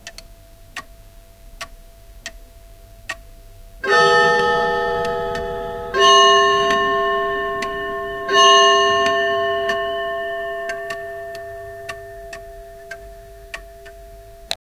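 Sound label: a heavenly clock ticking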